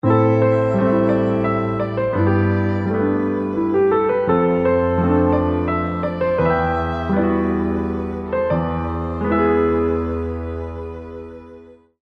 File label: sad jingle